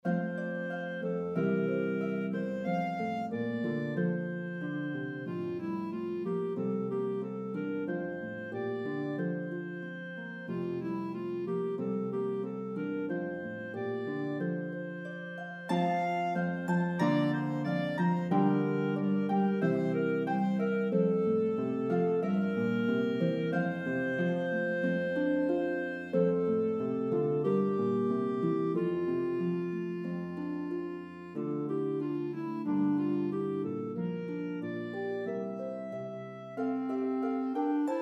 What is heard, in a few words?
Harp Melody Instrument Clarinet